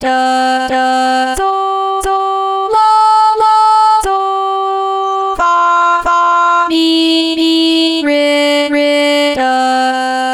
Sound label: twinkle, solfege; Twinkle twinkle little star